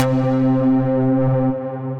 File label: Staccatoid, Synth Lead; Ableton; Factory Packs; Build and Drop